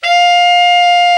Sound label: BRA TENOR, SAX; Best Service Gigapack 1; BR SAX 4